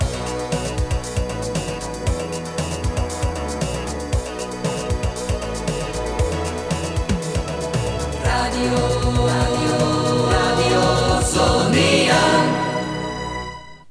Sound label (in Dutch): jingle nieuw traditional met kortere intro; jingles radio zoniën 1982-83